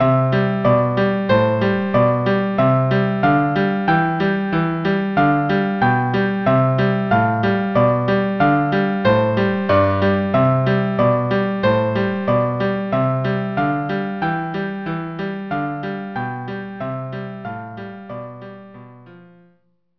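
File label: viola cello duo; traller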